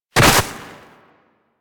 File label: Land Soft Snow, Footsteps; Warframe; Operator